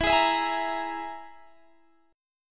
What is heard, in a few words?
G sharp Suspended 2nd Sharp 5th E, guitar